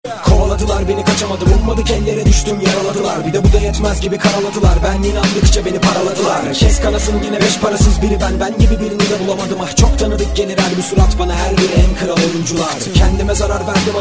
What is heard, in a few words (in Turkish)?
Türkçe rap